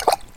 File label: water; Skippy Fish